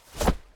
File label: object grab textile